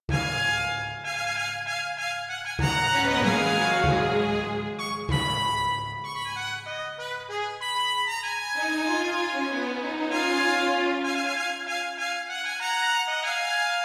victorious, music; Mount&Blade Warband; swadian

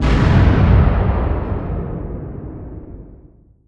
distance ships destroyer attack; Hearts of Iron IV Death or Dishonor

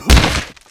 fall pain, player; Garry's Mod; hl2

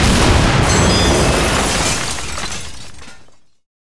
super heavy battleship attacked; Hearts of Iron IV Death or Dishonor

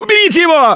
scream while pounced; Gambler; russian

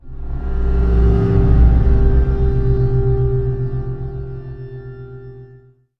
a glimpse of death, music; Left 4 Dead 2

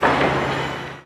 construction; Hearts of Iron IV Death or Dishonor

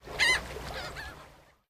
random gulls; random amb sounds

